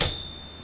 typewriter ding

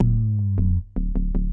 onda fone bass

